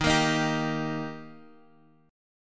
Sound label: D sharp Major D sharp Guitar Half Step Down, chord